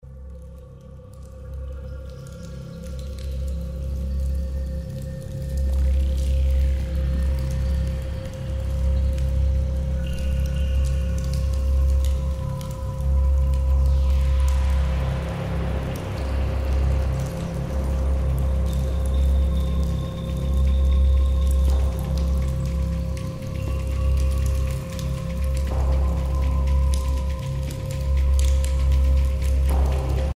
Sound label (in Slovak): Ukazka 528 Hz